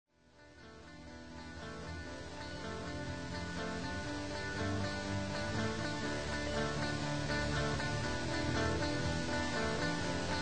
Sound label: Suspense